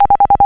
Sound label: Error, Signalling Tones Elevator Phone